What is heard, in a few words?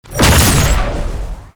Laser Wall Start; Enemies; Weapons; Warframe; Tau; Sentient Dactolyst